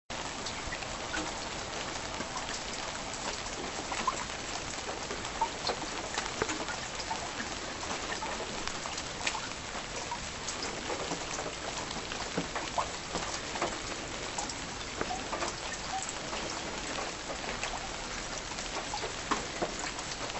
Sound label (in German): Regen Obstkeller